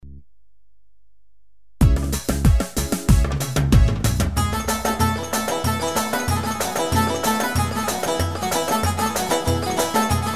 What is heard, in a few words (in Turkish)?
misket; sx3000 ritim; yeni ritim demo